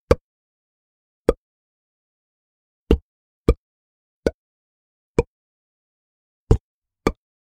Mouth Popping Sounds

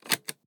Lock

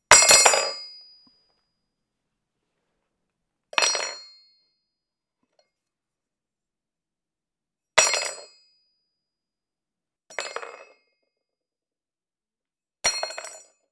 Small Knife Drop

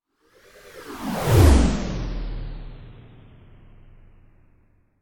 Whizzind Transition

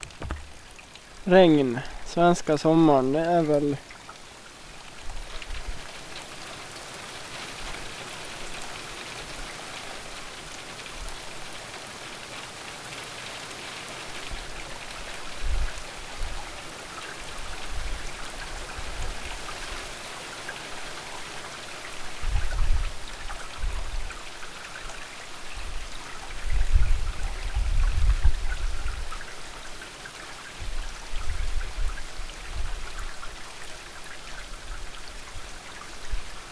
Rain

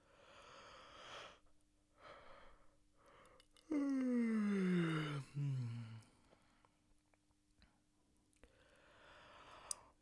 Yawning

